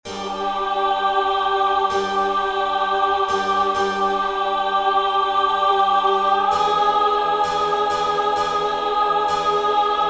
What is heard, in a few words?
Magnificat alt